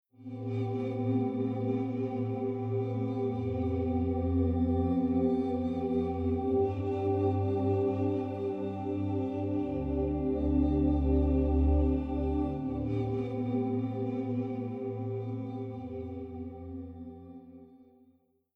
Future bass Chord Pad Main

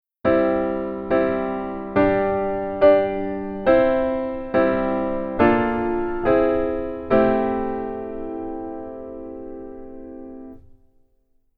Keyboard

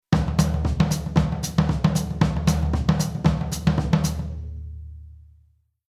Rhythm Jungle Beat

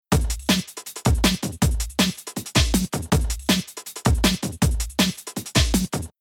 Rhythm DnB

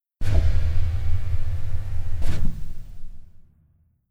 Keyboard EP Noise

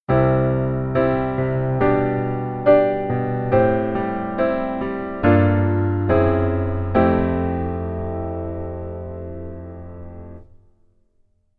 Keyboard

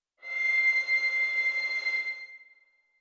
harmonics

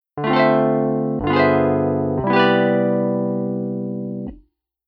Keyboard EP Soundmake Preset

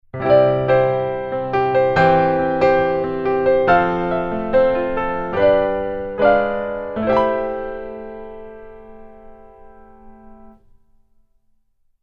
Keyboard Piano Hi

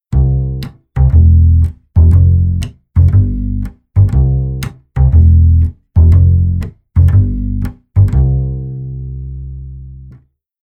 Bass Playstyle Pizz

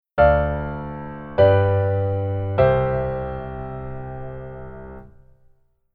Keyboard Voicing Basic Interval

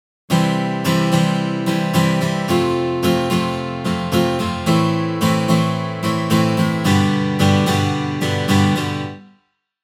guitar 8beat midi

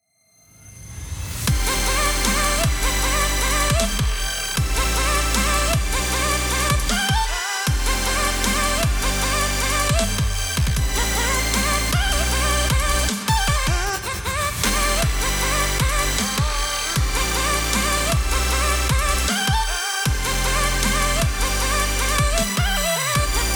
Mix Limiter Off

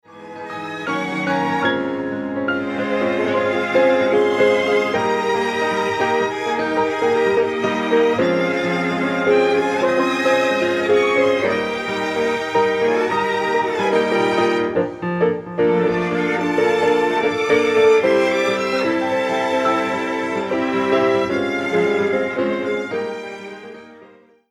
Strings Block Piano On

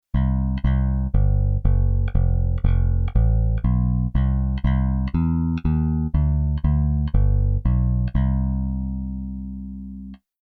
Bass Reggae Bass

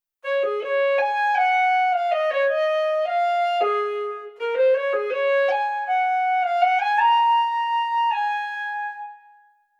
Cl breath

